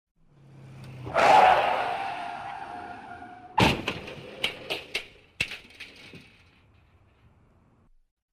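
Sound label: Car brakes sharply at speed and crashes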